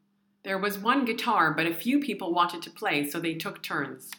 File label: revezar guitar